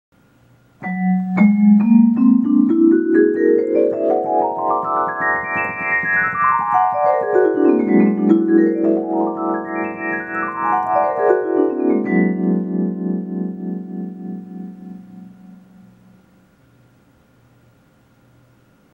Dream Sequence Flashback music effect whole tone scale